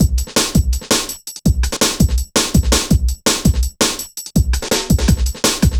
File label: Programmed Stepz, Jungle Drum Loops